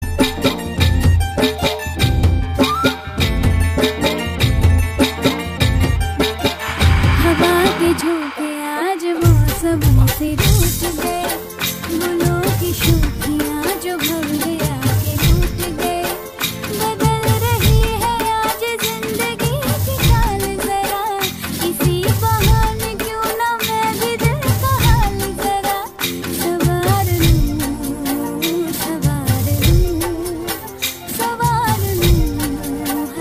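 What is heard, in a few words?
dandiya mix ringtone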